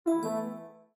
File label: Windows Hardware Remove; abbottabad compound